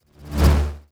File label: Jolt Standard